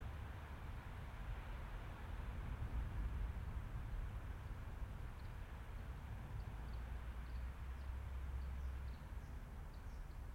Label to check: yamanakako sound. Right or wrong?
Right